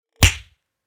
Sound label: Cartoon Punch